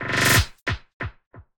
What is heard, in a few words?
alert, notifications; Android